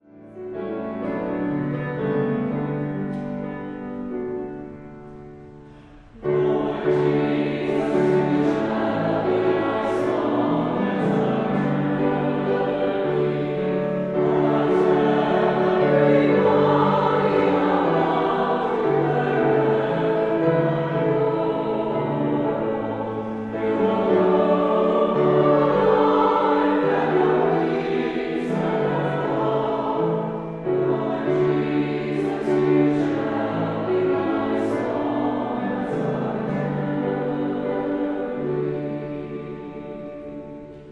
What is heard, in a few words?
Choir rehearsal